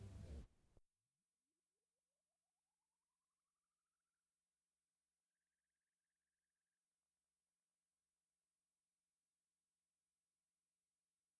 cowbell

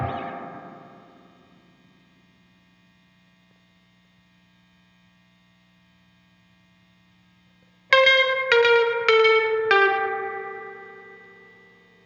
guitar overdub; guitar overdub gareth

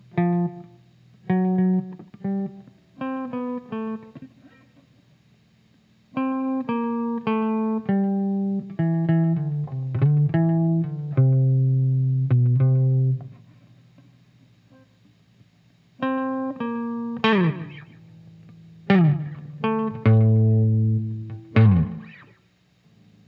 bass slide

